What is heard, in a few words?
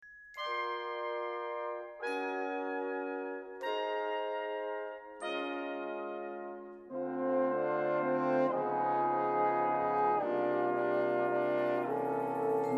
brass